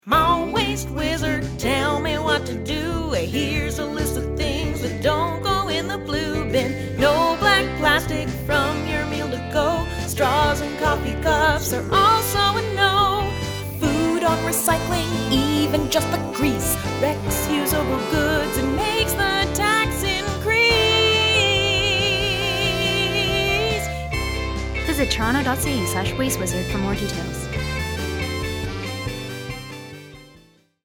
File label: JINGLE; Waste Wizard (spec)